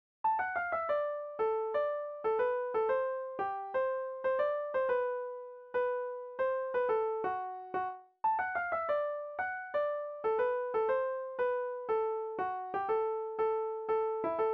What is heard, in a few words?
dulcimer blues; st charles